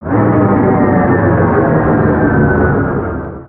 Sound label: Siege Tower Travel; destructibles